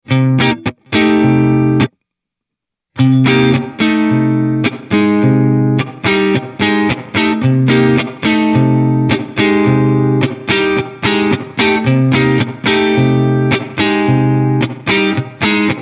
Spring Dark, reverb; Noon

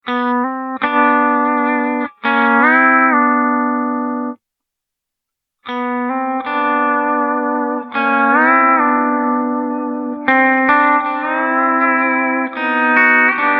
Hall Modern Pedal Steel, reverb

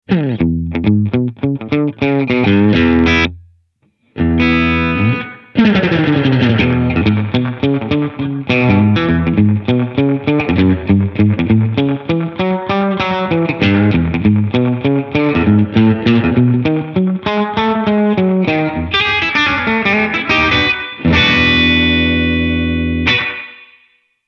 Spring Bright Country Bumpin, reverb

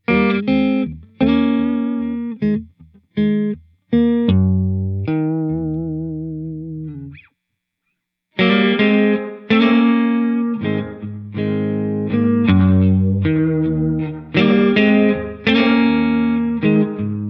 Spring Bright Tele Neck, reverb

